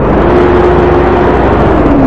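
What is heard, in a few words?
generator harsh noisy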